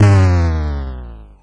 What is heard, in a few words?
boring